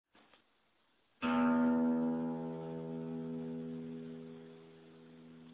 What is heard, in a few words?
e lower